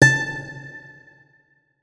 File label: notes, guitar; Hideout Furniture